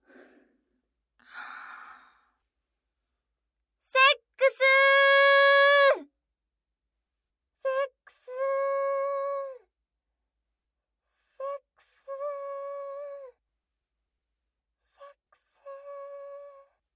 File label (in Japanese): やまびこ